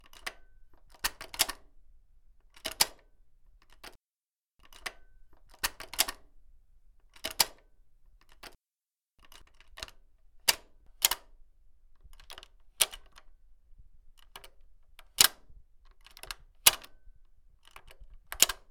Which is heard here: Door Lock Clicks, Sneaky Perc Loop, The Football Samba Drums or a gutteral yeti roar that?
Door Lock Clicks